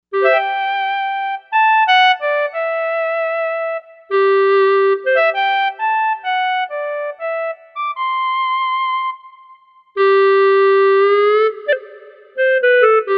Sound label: Clarinet, Korg M1Rex; Factory Patches